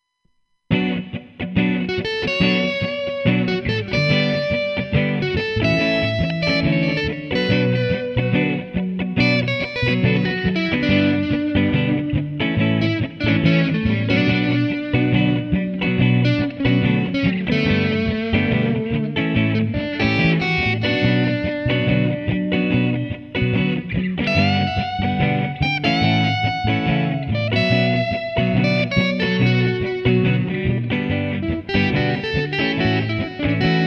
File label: guitar reggae